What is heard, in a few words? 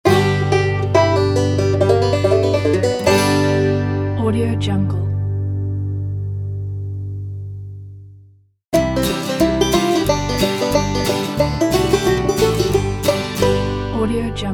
country bluegrass banjo logo pack